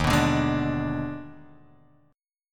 Eb Major 7th Suspended 4th Sharp 5th Eb down Guitar Drop D